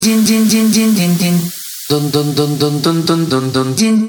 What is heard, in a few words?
sms din din